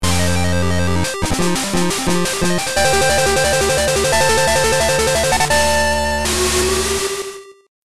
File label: Train; Pokemon Essentials; PKMN Estelar 1.3